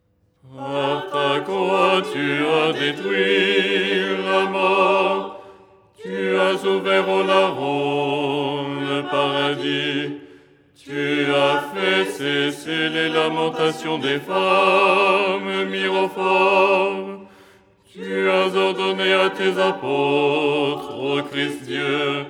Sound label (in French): basse; Tropaire du dimanche; Les 8 tons en français